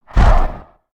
Wing Flap; creatures organic

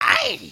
knockdown; creatures organic

